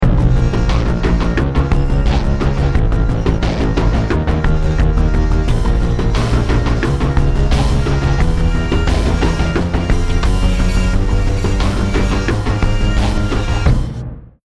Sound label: start action, music